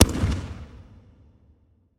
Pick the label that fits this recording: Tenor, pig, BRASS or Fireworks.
Fireworks